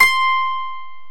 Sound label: 12 STRING; E-MU Producer Series Vol. 2; More Studio Essentials; Composer